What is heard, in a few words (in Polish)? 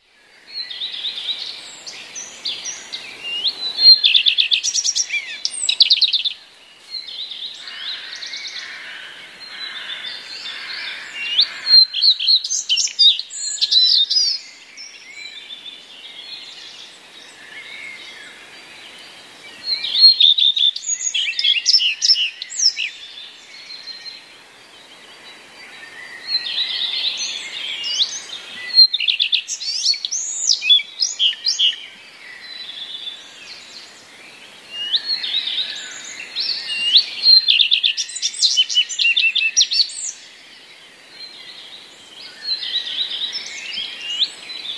pleszka, nagranie pleszki wykonane przed wschodem słońca; Pleszka jest małym ptakiem wróblowym; Pleszka jest małym ptakiem wróblowym, który u nas nie zimuje; wroblowe; zapozyczenia